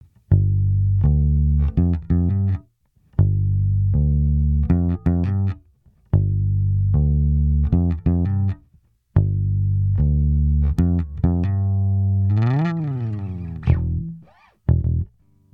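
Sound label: RND Portico 535 E Bass Blend 100 SC HPF ON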